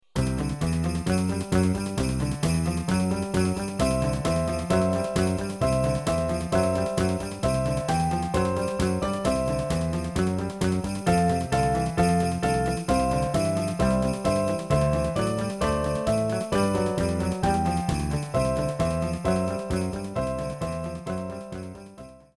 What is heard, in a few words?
Jingle Bells; Jingle Bells Express